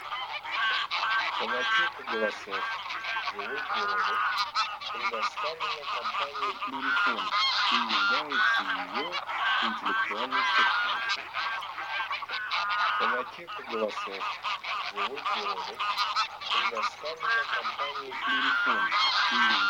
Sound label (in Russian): Гусь серый белолобый Oca selvatica lombardella; plurifon